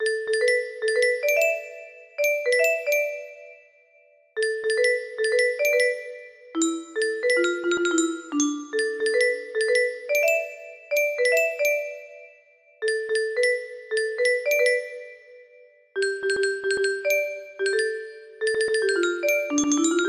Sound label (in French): voix, music box